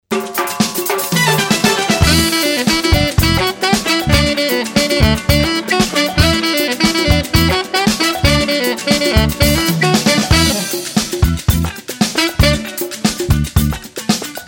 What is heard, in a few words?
conga